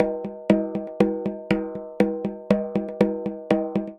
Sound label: 64 Pad Finger Snare; Drum Kits; Ableton; Drum Booth